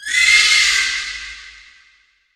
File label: shriek; smite; thanatos